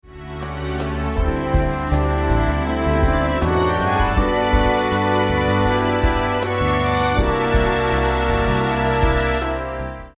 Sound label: strings